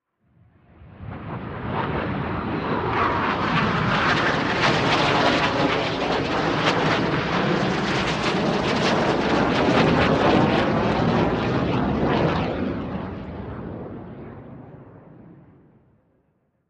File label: Plane Falcon 20 overhead Close, Airplane Falcon 20 overhead close jet